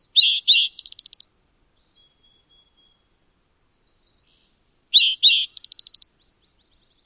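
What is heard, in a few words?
songs, Spotted Towhee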